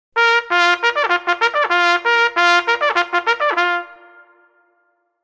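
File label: signals, bugle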